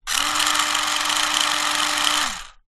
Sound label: leisure radio controlled car rev free wheel wheels in air; zapsplat